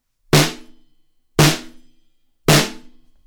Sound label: NT3 Snare over Chandler EMI REDD47